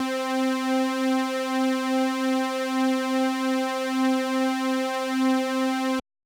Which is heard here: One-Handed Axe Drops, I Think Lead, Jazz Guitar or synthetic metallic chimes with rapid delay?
I Think Lead